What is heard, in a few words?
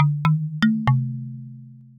grave jingle; gbjam12; jingles